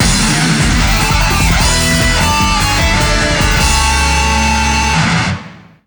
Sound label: Jingle Rank Up; Initial D; SEGA Original Sound Selection Vol.2